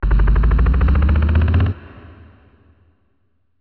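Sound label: stretch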